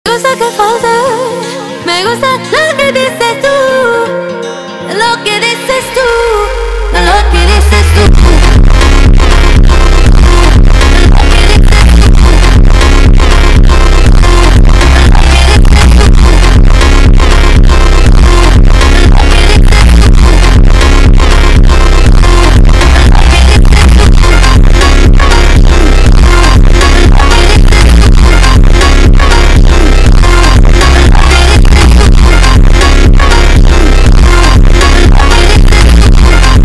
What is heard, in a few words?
Phonk bassboost